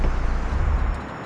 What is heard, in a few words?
stop, vehicles; primo